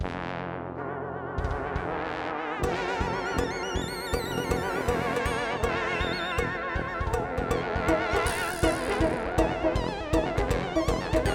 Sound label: Battle Low Health; Iggy